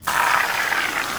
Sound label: Foam Espresso Fill; SFX Kit; Foley - Misc